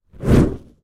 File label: fire whoosh